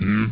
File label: MMMM